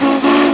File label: Santa Fe whistle, Trains; 3985 Yermo